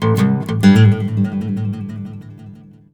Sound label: BARI LICK, Blues Guitar; ILIO; Fretworks